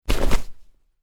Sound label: Cloth Character Movement Foley Whoosh; Arm or leg movement with cloth foley.; Miscellaneous Human Sound Effects